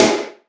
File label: smooth bottom end snare sound G sharp key; Duration 0:01; snare drum samples